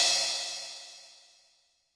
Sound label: Chinese Cymbal; YAMAHA RM1X